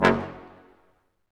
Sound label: BRS Quintet stac; Roland L-CD702